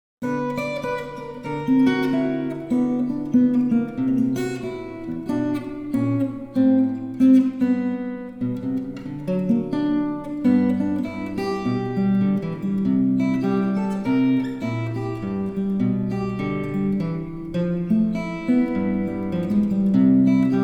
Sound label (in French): blues en sol